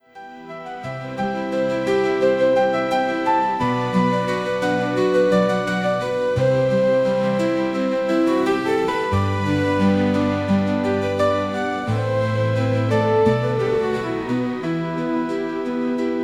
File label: altered pulse code